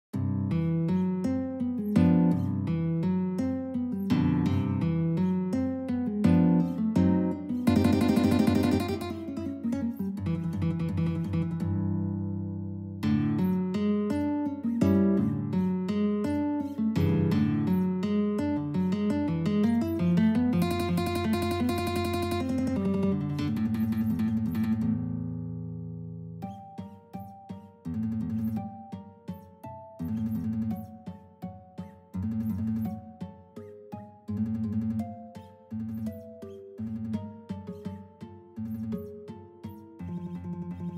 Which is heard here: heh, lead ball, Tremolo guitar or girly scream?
Tremolo guitar